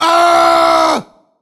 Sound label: male scream; Voice; Human